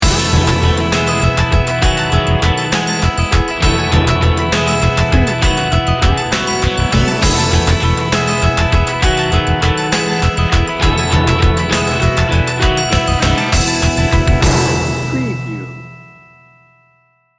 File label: celtic pop